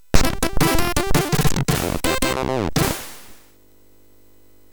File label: Score, MOS6581; MOS6581R3